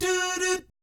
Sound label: DOO DOOT, Vocal Planet Jazz; ILIO; Jazz & FX; JAZZCOMBO